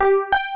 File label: Two tone